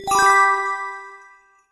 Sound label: win